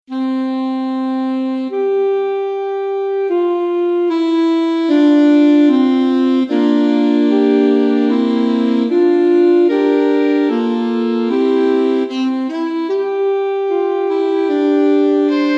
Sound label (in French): Trio de saxophones